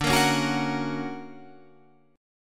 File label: D Augmented Major 7th down Guitar Drop D